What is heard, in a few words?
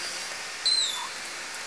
call, L pod, Orca; SRKW; Alwin